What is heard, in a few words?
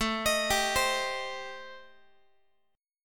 A-Minor 7th Flat 5th, Guitar, chord; arpeggiated; JGuitar